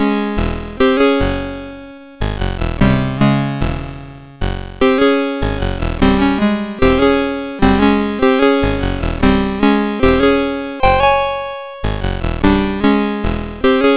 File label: sid CSG8580R5; Mondus Fight Palace